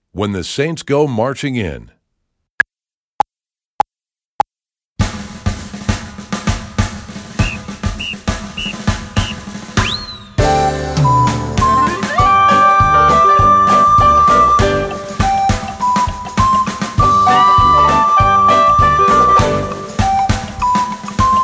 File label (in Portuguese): When The Saints Go Marching In Com Flauta; Nivel 4; 4.o Ano de Escolaridade; Obra Obrigatoria; 11o concurso de flauta de bisel municipio de redondo